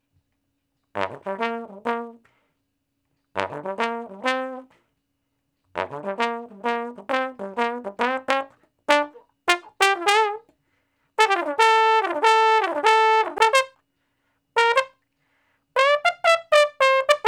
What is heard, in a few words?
Trombone Straight (G); Crimson Blue and Fabulous Horncraft 4 RnB; Horncraft for R&B; Solos